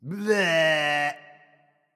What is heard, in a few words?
tongue; smite; zeus